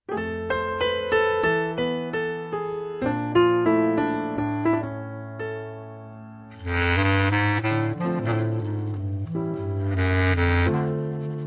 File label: Swingin The Samba